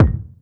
natural kick drum single shot a sharp key; Duration 0:01; Samplerate 44100 Hz

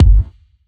short reverb steel kick drum G key

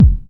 dry kick drum sound f sharp key; kick drum samples

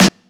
sparkly steel snare drum f sharp key

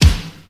Steel Snare Drum D Key; snare drum samples

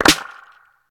large room hand clap sound d sharp key

